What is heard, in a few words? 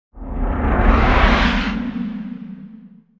Low Wind Rip; Hollywood Edge Sound Designers Tool Kit